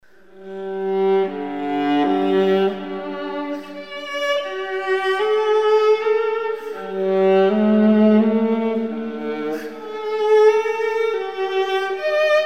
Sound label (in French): Suite royale alto; 1er mvt